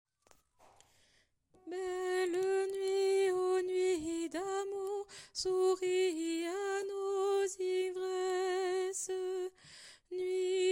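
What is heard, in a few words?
Barcarolle, Basse; Mesures 1 à 28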